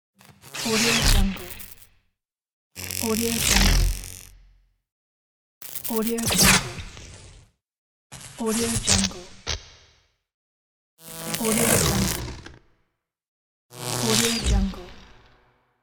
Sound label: electric zap